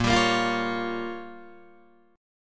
A sharp Minor 6th A sharp down Guitar DADGAD, chord